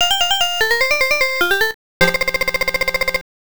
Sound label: Break Time ~ Return Home Music; Pac-Land; TurboGrafx-16